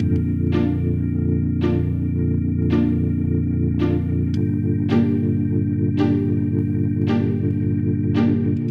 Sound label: Low Pity Party Loop; Quest Rookie